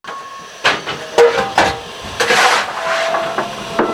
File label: coal shovel; castle class